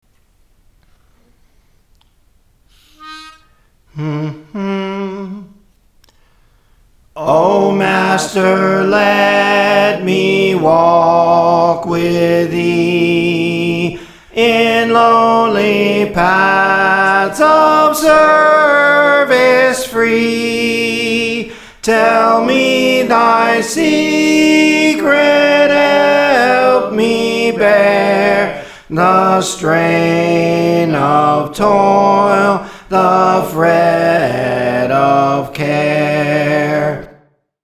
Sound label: Soprano; Praise For The Lord Training